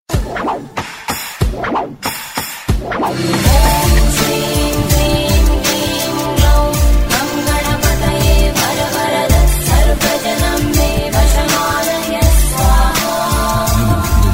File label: Ganesh Bass Aarti; Ganesh Chaturthi Special Ringtones